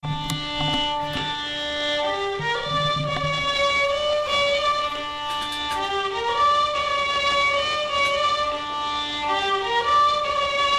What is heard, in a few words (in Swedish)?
ukrainsk vals